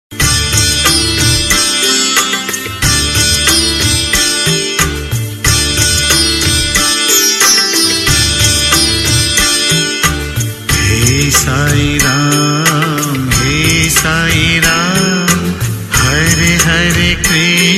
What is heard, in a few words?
sai dhun ringtone; saibaba